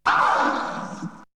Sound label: Hit; ZERO G - Datafile DISC 1